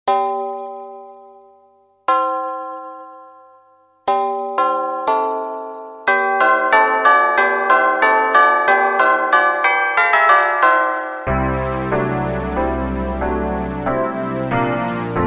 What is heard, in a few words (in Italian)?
concerto prete e campane